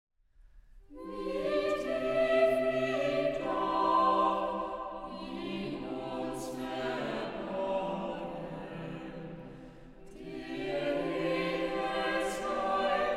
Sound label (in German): Chor; Paradisisches Wunderspiel